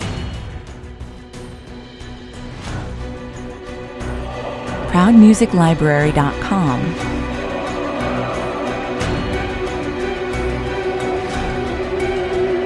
with choir

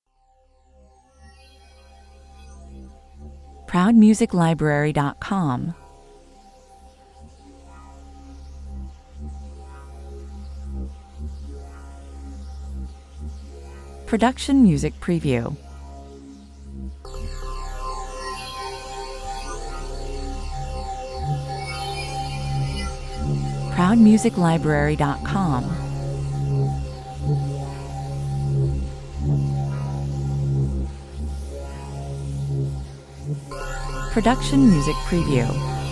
hemisync brainwaves 95 bpm

